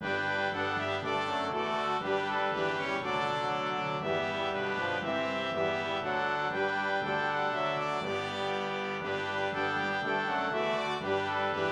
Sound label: Brass